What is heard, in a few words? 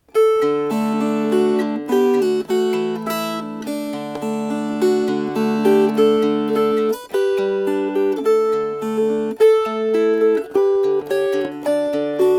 Fingerpicked